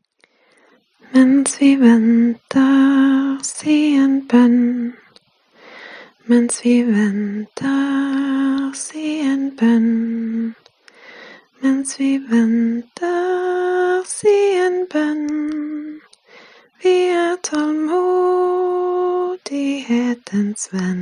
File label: Song, NO